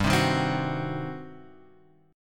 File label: G Major 7th Suspended 4th Sharp 5th, G; GM7sus4#5 Chord {3 3 1 X 1 2} strummed